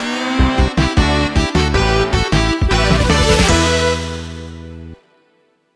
Stinger Victory; Beholgar

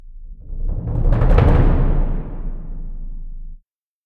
Percussion stinger; Toto Temple Deluxe

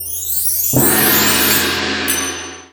Character Defence

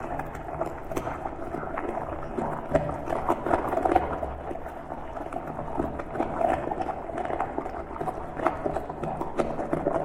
Human Loop Amb

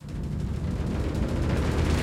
Combat Boss Start; BIOMUTANT

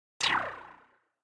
Lasereye Shot

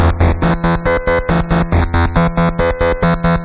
Melodic

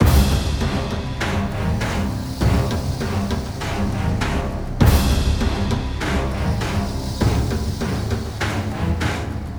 Death March; Black Reliquary

